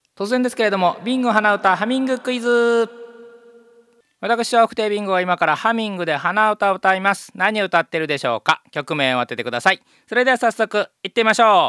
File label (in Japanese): hanauta